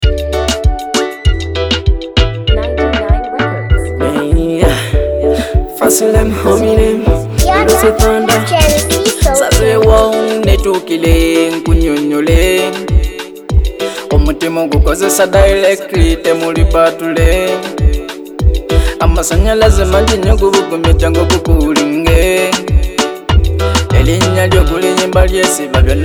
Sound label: Love, Ugandan Music